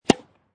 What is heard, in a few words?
Tennis Hit Ball with Racket Close; Sports